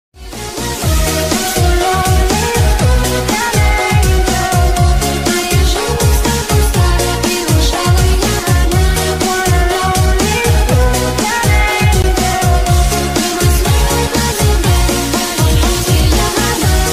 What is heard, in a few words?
background